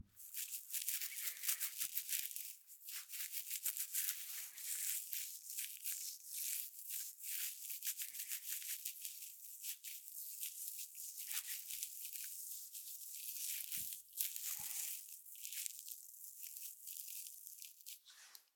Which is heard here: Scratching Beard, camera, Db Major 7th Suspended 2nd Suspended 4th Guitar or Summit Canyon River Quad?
Scratching Beard